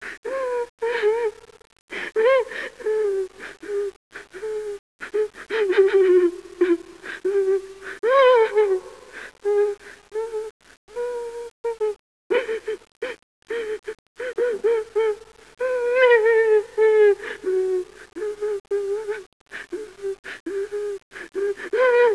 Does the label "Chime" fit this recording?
No